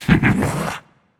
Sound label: yay; smite; khepri